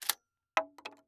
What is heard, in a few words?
Weapon Bullet Shell 12 Gauge Eject Shells On Boardwalk; AdobeCC